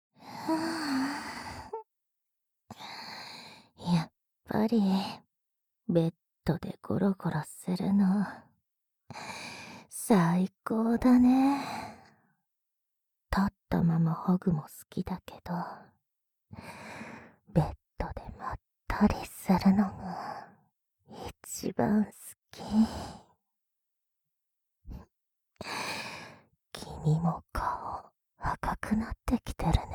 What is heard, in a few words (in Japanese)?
耳舐めで可愛がってあげる SE無し; SE無し差分